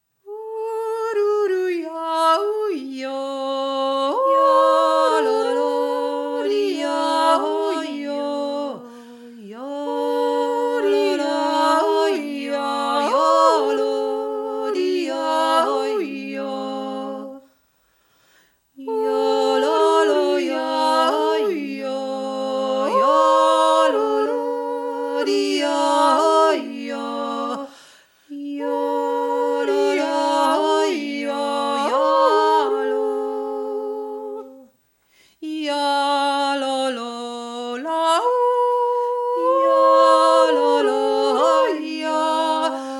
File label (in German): obelwaldner naturjuuz